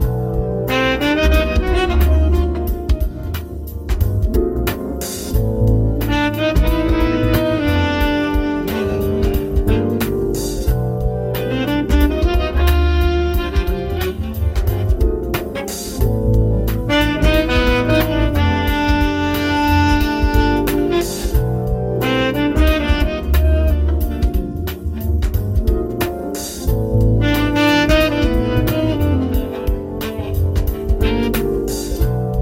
jazz fusion rooftop bar evening saxophone electric piano upright bass smooth groovy; relaxing; AI audio; Stable Audio; Stability AI